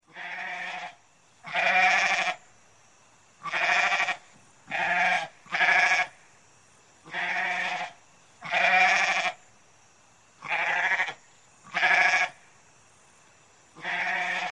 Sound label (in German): ziege